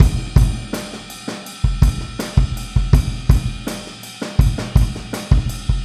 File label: Boogaloo Chorus Kick, 82 BPM